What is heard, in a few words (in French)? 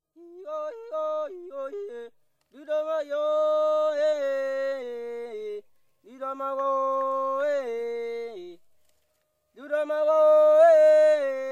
aka voix debut, afrique